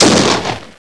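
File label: explosion near; SHELL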